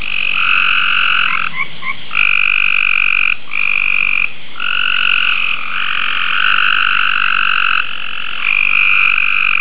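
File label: gray treefrog; frogs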